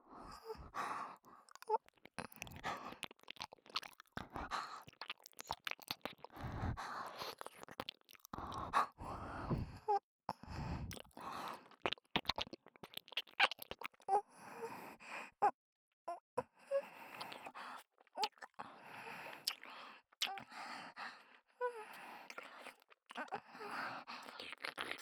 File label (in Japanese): 耳と首筋を舐める（右耳）; せいえき☆検査病棟